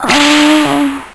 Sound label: whip moan